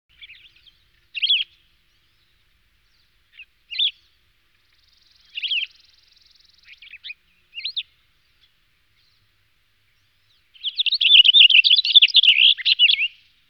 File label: finch Cassins; birdsong